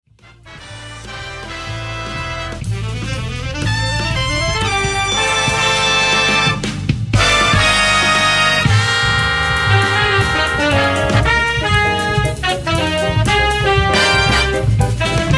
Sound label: Samba; Big Band (Lead Alto on Soprano, 4/5 Trumpets, 4 Trombones); This straight forward samba is great for featuring the entire ensemble.